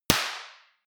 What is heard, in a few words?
party popper